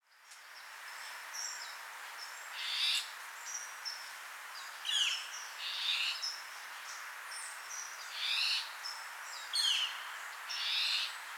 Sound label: Dawn sounds